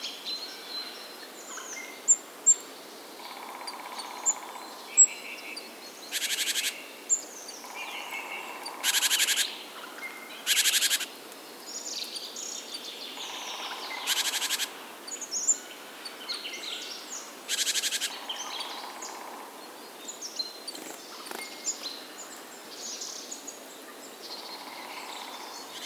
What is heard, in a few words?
Spring Birds Nature Ambiance